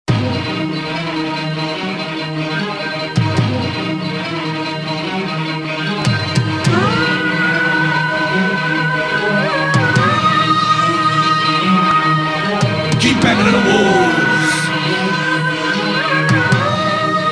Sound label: asian dub found